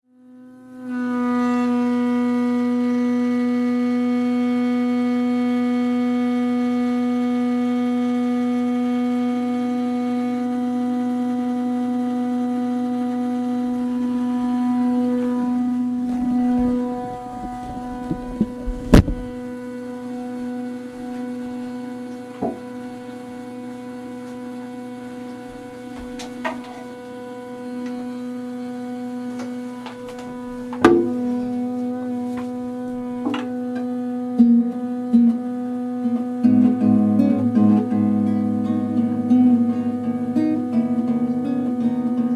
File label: pipes in c